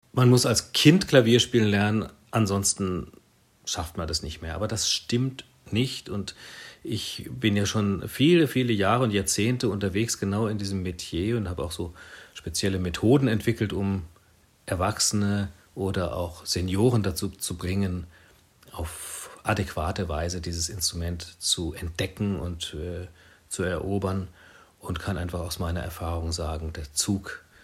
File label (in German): klavier